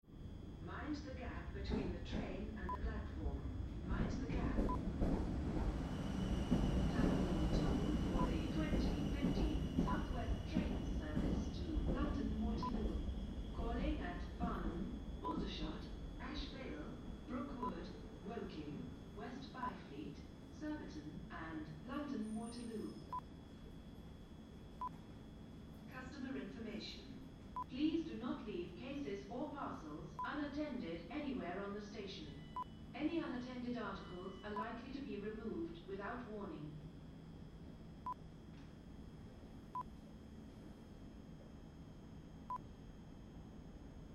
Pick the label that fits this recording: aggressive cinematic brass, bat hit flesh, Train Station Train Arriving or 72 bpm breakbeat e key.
Train Station Train Arriving